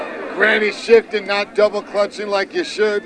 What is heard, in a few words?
clutch